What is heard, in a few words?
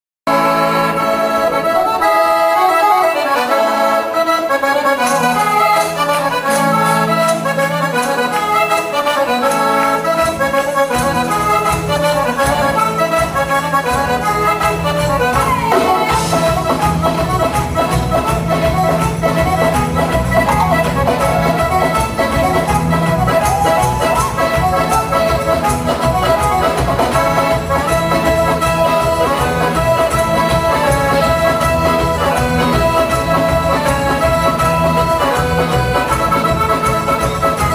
Tarantella Calabrese